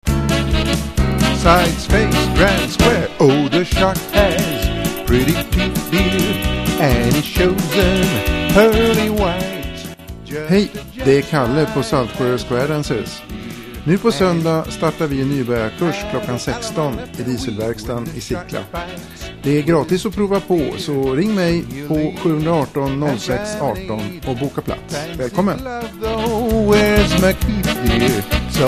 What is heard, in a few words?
Vinyl